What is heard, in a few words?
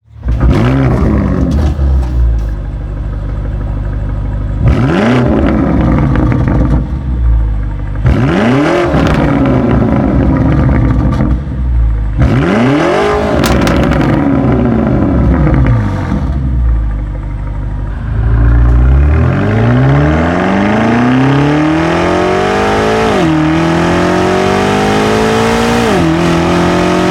Complete Exhaust System BMW M5, Akrapovic Slip-On Line (Titanium)